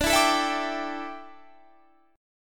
D Suspended 2nd Suspended 4th D, down, Guitar Standard; strummed